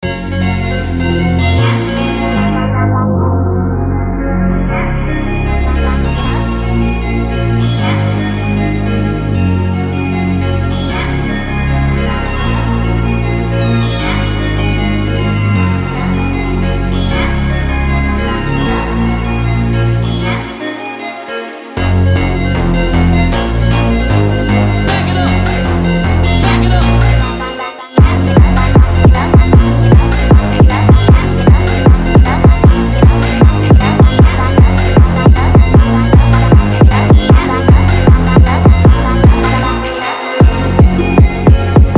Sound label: Sped Up, Phonks